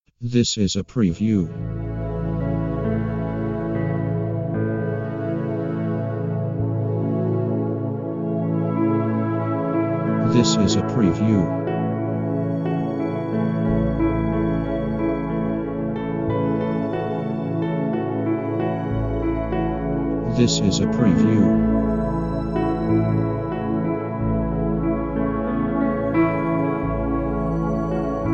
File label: Peaceful Piano Atmosphere